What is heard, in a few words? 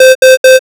alarm